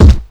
clean kick drum single hit g key

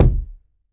deep bass drum single hit e key; kick drum samples

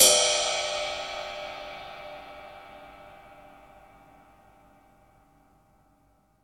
roomy ride cymbal drum sound d key

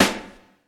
reverb steel snare drum sound g sharp key

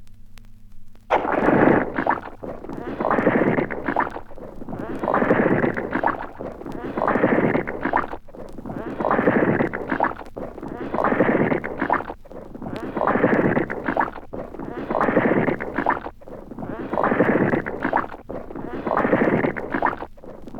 squelching footsteps

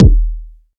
small reverb kick drum one shot D# key

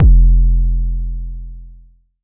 phonk kicks oneshot - 808 f; kick drum

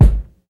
00s kick single hit g sharp key

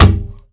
tom drum one shot b key

